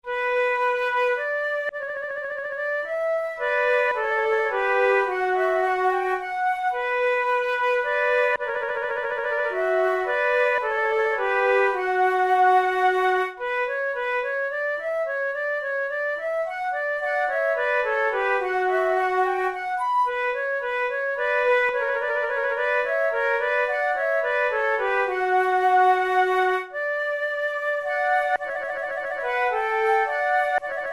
two flutes; Monteclair; 6 concerts; le papillon; flute tunes

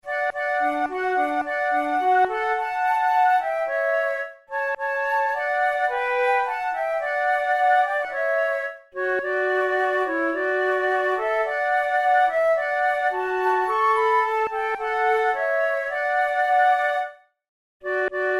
duet, flute

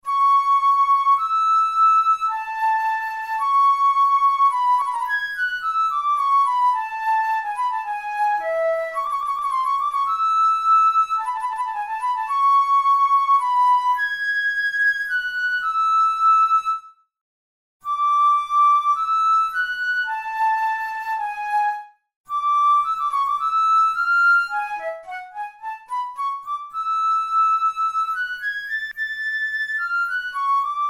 studies for the boehm flute; drouet 72

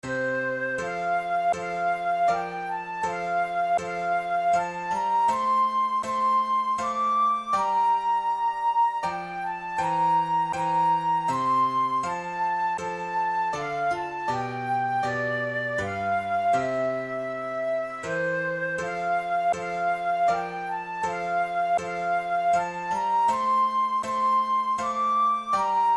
cradle song, flute

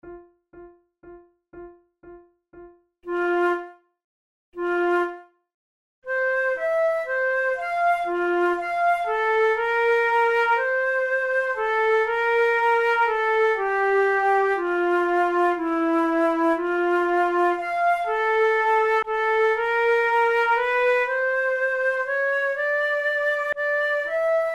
prelude, flute

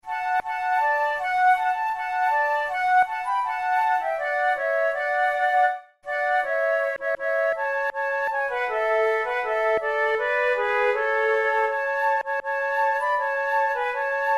duet, flute

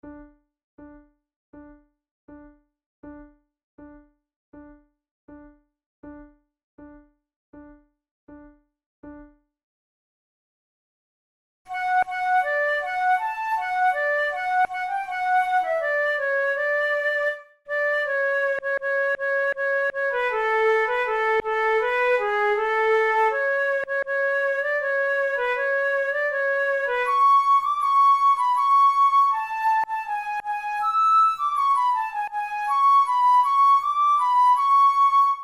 duet, flute

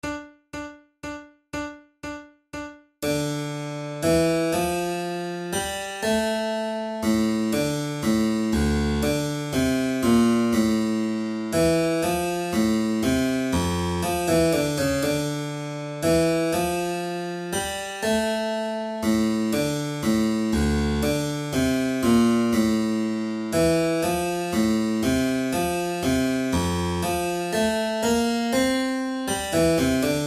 bach minuet in d minor, flute

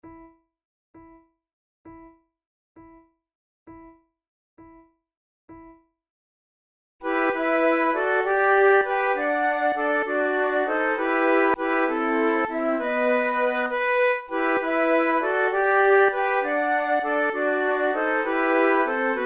quartet, flute

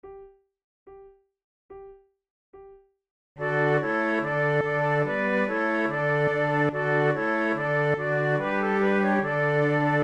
gavotte, flute

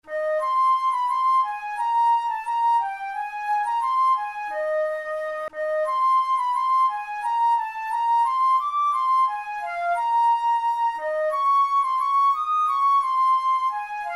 flute

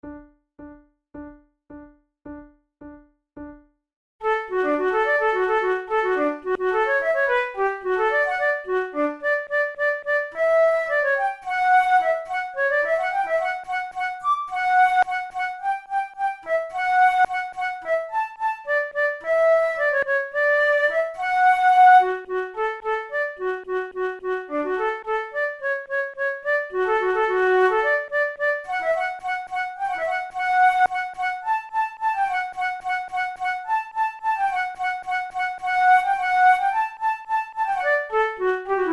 air from the overture duet, flute

